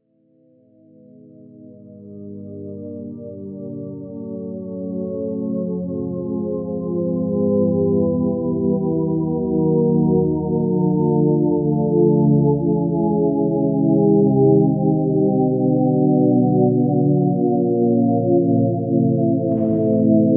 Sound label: shepard risset glissando